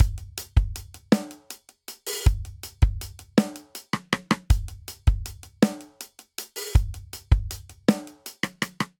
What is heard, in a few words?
Straight Six Rock Verse Variant 6/8 80 bpm; Ableton; Drum Booth